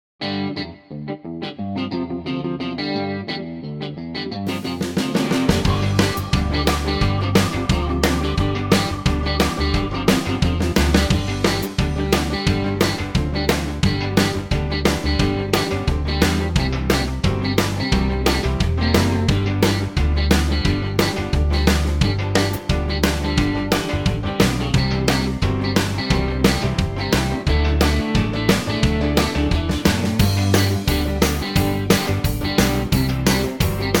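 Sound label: G, Backing Tracks for Male Vocals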